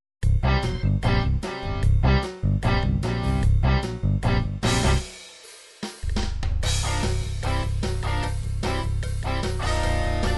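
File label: 8 beat up tempo, midi; 8 bit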